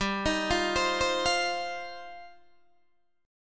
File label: G 7th Suspended 4th Sharp 5th, Guitar DADGAD